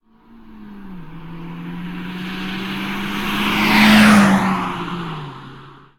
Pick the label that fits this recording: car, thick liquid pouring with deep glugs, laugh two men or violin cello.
car